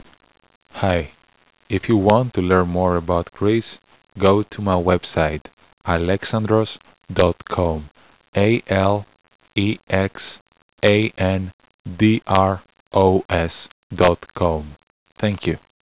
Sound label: anthem, Greece; Anthems